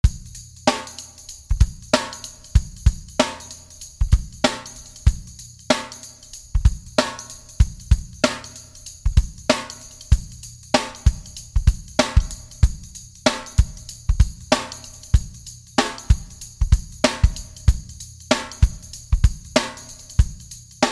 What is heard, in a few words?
Tambourine Shake Acoustic Drums